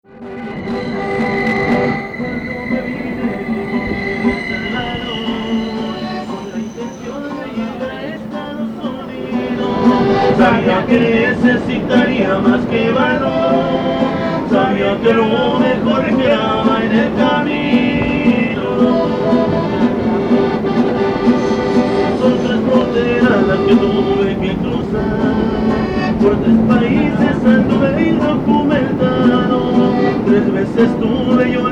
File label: mexican Music Train